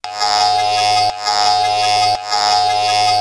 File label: Video Game Transiti